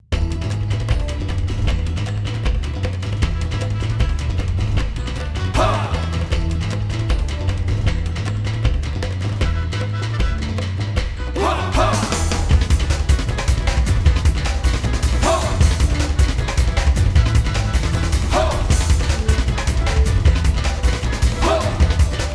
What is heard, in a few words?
Jungle fun